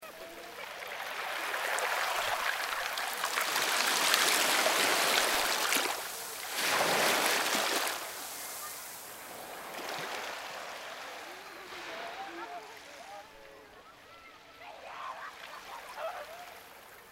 PLAYA CANTOLAO LA PUNTA ORILLA CON GENTE DE FONDO

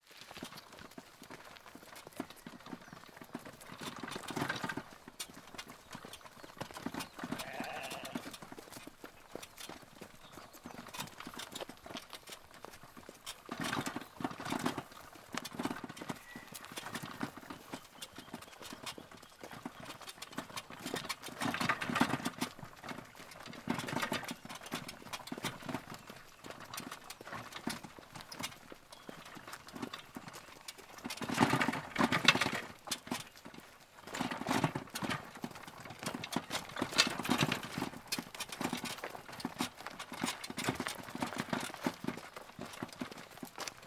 CARRETA CARGADA DE LEÑA JALADA POR BURRO TOMA SUBJETIVA

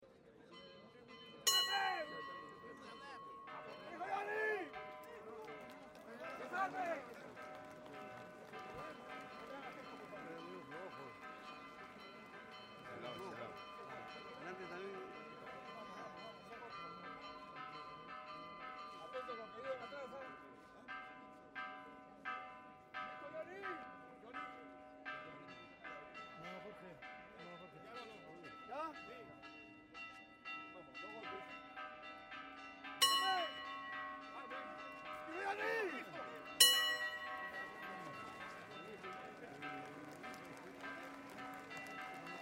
PROCESIÓN CAMPANAS VARIAS Y APLAUSOS; SEÑOR DE LOS MILAGROS

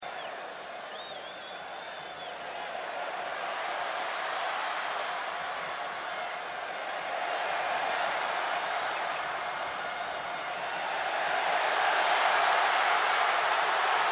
PARTIDO DE FÚTBOL GRITO DEL PÚBLICO; PERÚ VS CHILE

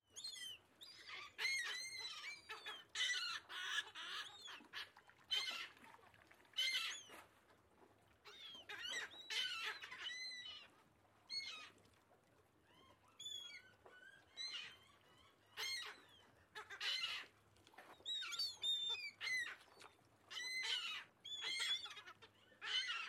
GAVIOTAS Y OTRAS AVES DESDE MUELLE HOTEL PARACAS

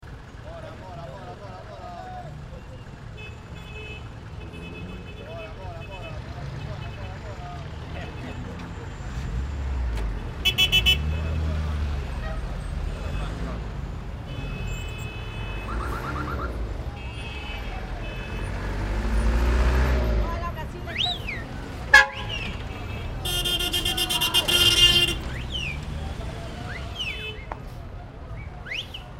AV ANGAMOS DESDE COMBI LLAMADORES PRIMER PLANO

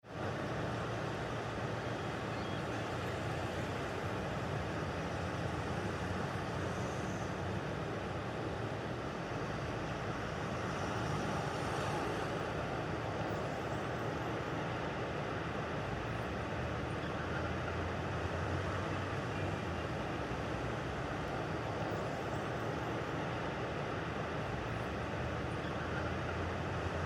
AV ALFONSO UGARTE TRAFICO CARGADO DESE LO ALTO

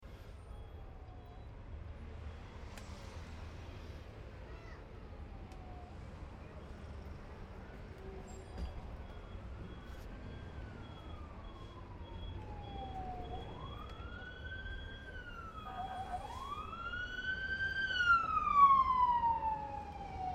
SIRENA PASANDO DESDE INTERIOR METROPOLITANO

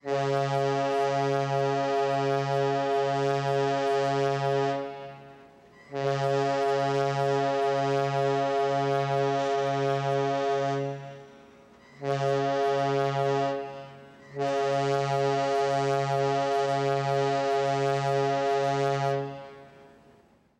BARCO GRANDE SILBATO

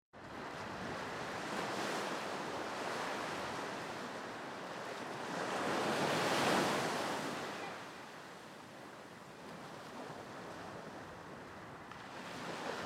MAR PLAYA LA MINA PLANO ABIERTO DESDE ORILLA PARACAS

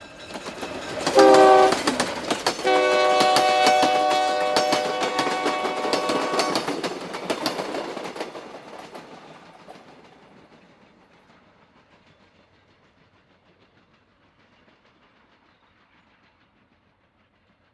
TREN PASAJE ALTIPLANO CON SILBATO

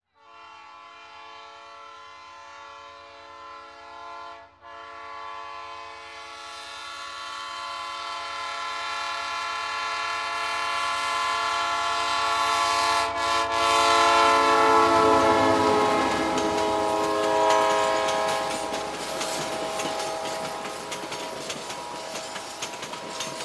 TREN PASAJE YAULI VALLE DEL MANTARO

